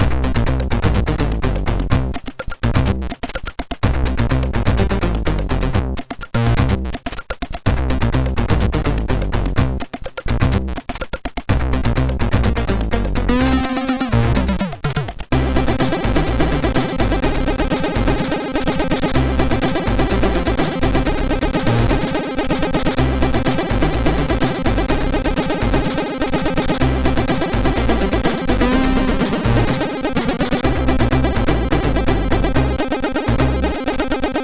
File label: Game Level 2, MOS6581R2